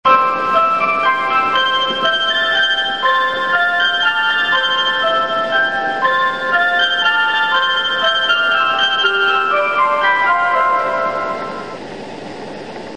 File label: bus chime; ＪＲバス; マロニエ新宿号車内チャイム